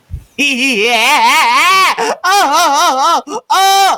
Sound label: laugh